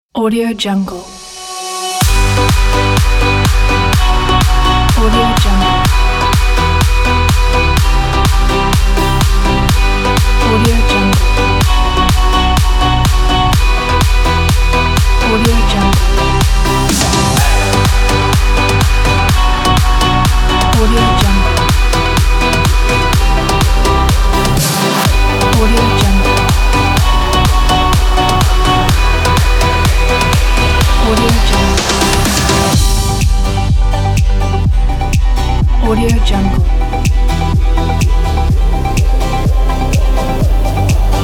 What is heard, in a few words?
pop dance spring